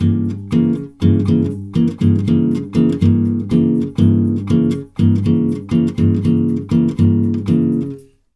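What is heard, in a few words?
120 guitar G diminished, brazil bossa; USB Soundscan; AKAI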